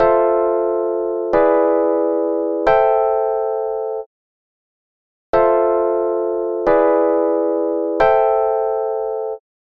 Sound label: Chord Sweet FM7 E7 Am7